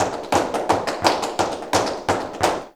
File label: JALEO TAP, Flamenco